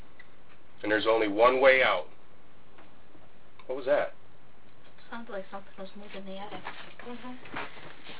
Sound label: footsteps in the attic